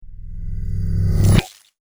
Science Fiction Magnetism Electrical Super Power; Sci-Fi

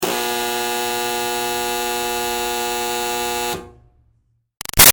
Buzzer Door Junky Long; Alarms; Sound Effects:Electronics; Big Room Sound

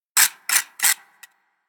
Sharpening Blade, knife; Knife Sound Effects; Fantasy Sound Effect; Andrew Fleming

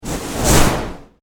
Science Fiction Fire Create Throw Super Power; Battle

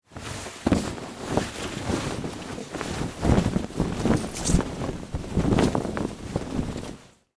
Foley, Canvas, Tarp, Roll, Up, Movement; Rustling; Objects; BlastWave

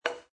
Billiard Pool Snooker Cue Hits Ball; Pool cue, snooker cue, billiards cue

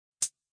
High Hat Closed; Hit Sound Effects; Musical

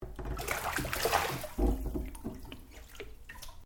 Water Bath Spa Movement; Domestic water movement; Water (Sound Effects:Nature)

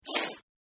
Sniff; Sickness Sounds; Sniffling; Human; Partners In Rhyme

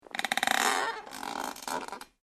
METAL CREAK; Materials

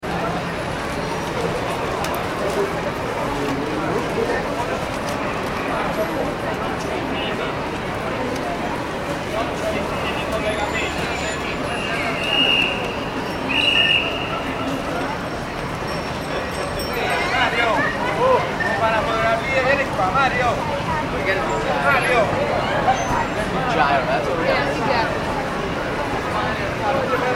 ambience urban city; New York City Street Ambience ( Times Square ) 7pm - Traffic And Pedestrians Close - By An; Nyc Times Square Ambience; Restaurants; McKinney Sound

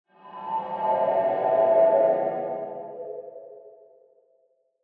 Horror Swell Ghostly; Horror Swell Ghostly Metallic; Scary Tones; Blastwave

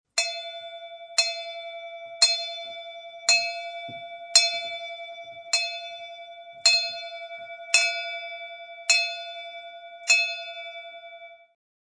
Bell Ring Multi

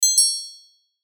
chime chords bright; Multimedia; Chimes; Blastwave

